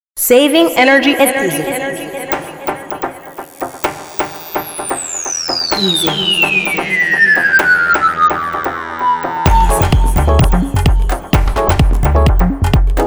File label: Saving Energy is Easy Techno Version